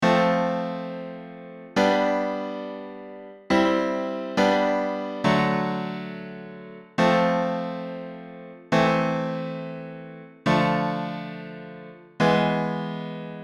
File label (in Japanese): Bメロ コード進行 発展系